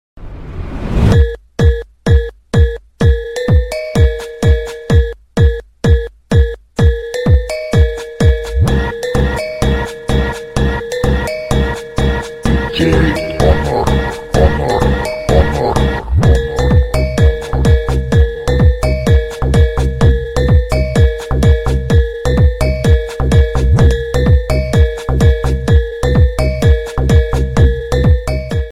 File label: rock ringtone